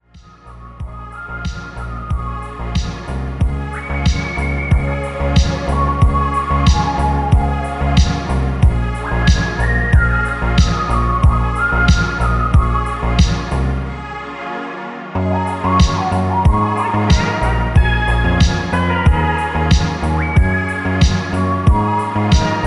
instrumentals lead

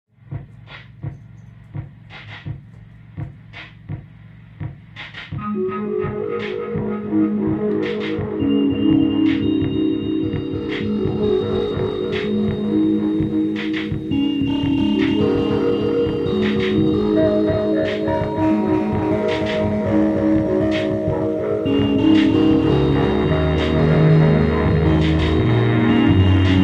synthy

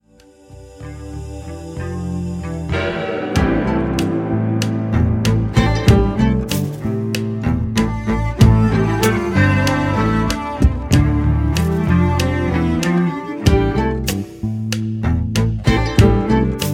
instrumental

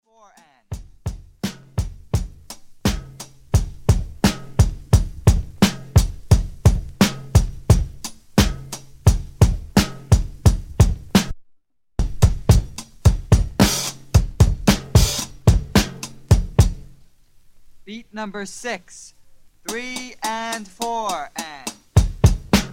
drum breaks needle drop

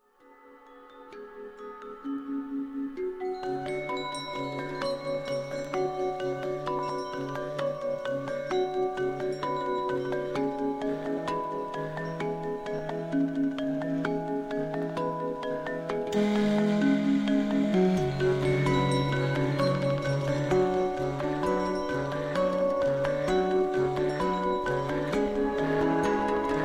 tuned percussion harp

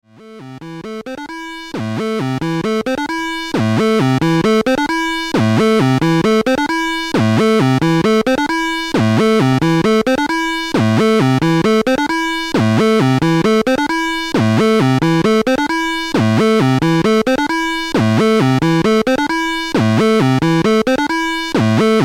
synth am c maj 13333

